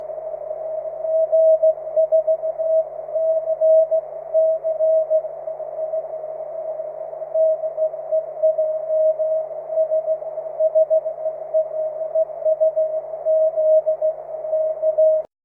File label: cw; 23cm